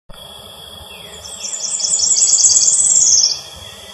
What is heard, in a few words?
Parula pitiayumi; PN Chaco